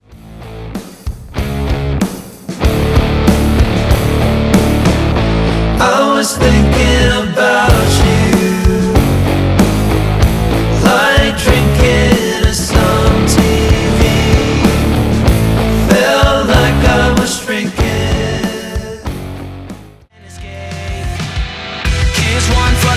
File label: Rock